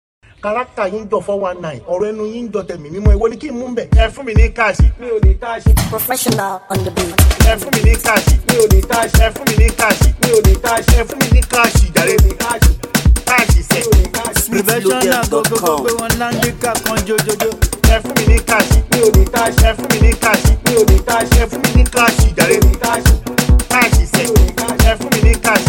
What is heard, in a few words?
Professional beat